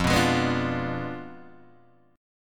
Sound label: F Diminished 7th F down Guitar Open G